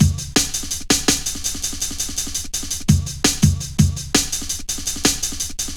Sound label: Fat Rolla, Jungle Drum Loops 166.5